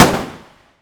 bullet smg; ufoai; weapons

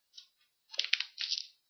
book

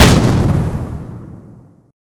ugv cannon fire; ufoai; weapons

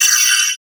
psi attack; ufoai; weapons

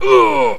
male death; ufoai; soldiers

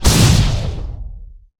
plasma huge; ufoai; weapons

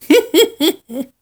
laugh old man

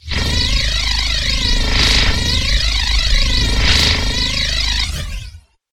bullet needler; ufoai; weapons

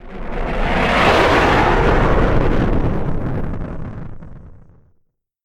human fly by

